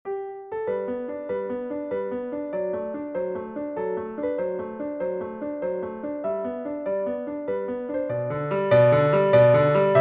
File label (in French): sonatine sol majeur